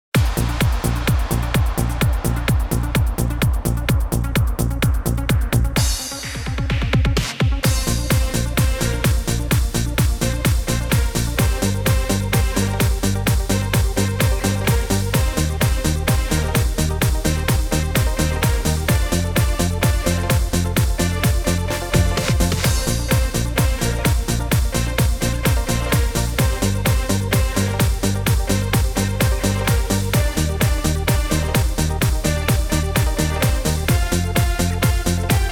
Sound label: Feel the beat Low Impact, jumping fitness; jumping fitness vol. 1